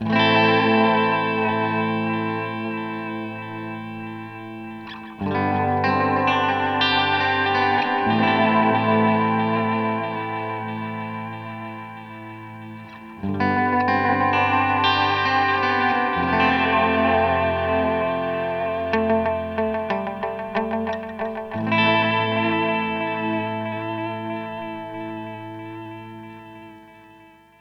Rewind Tape; Alexander Pedals

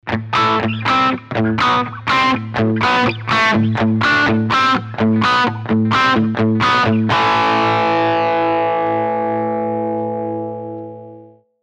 heavy

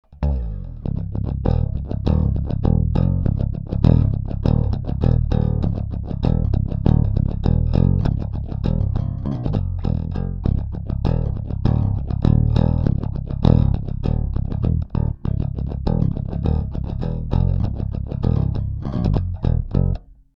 low

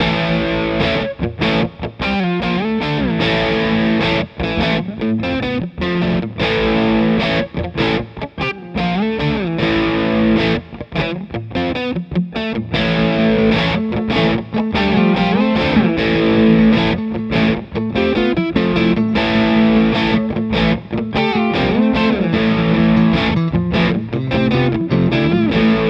Ibanez RG Gain Mid Position

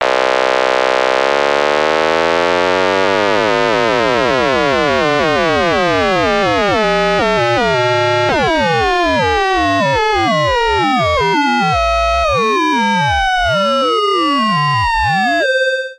Oberheim OB-X X Mod Sweep; GForce

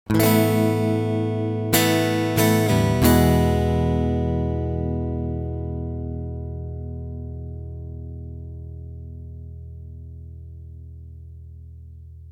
fast track Les Paul Chords

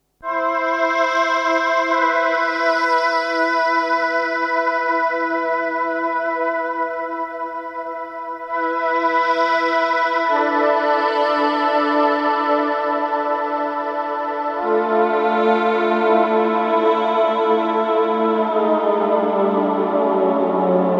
Lexicon PCM70 Infinite Reverb

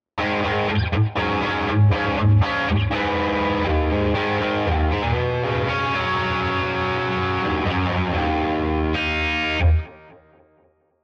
Riff EchoBoy

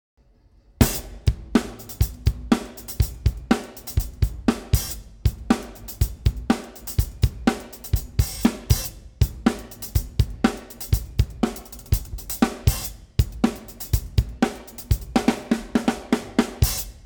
Drive; Freqport

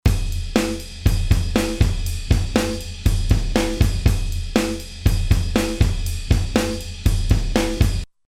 UA 476p drums vint 0 comp 0

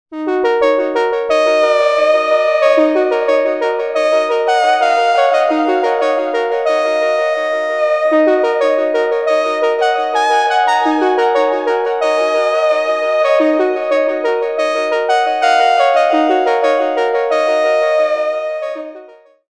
p5 lead

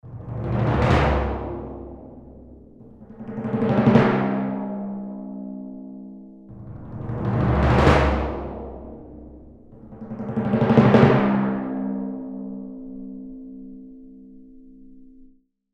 Timpanis

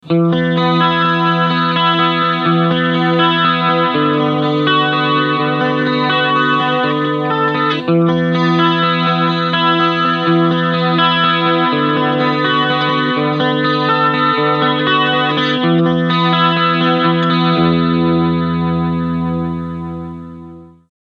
Clean WarmChorus

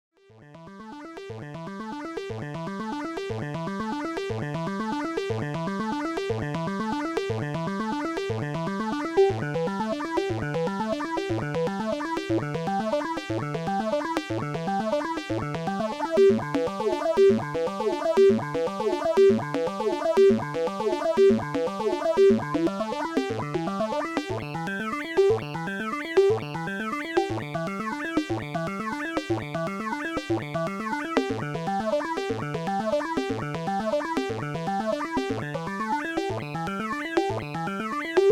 Rossum Electro Music Morpheus Symphony

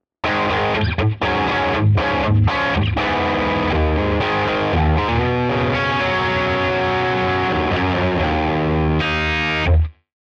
Riff clean processed

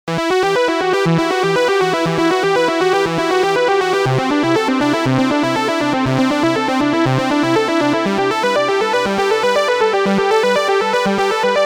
Disto Chord Lead Dry2Wet

